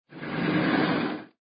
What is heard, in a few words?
transition; eight below